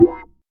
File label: hot acoustic snare sample f key; snare drum samples